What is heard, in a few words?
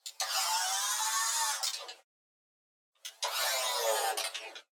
Servos Pitched Two Variations Sci Fi Robotics